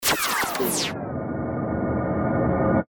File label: Ascend Digital